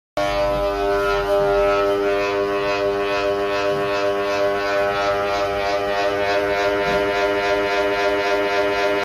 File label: ceiling fan; پنکه سقفی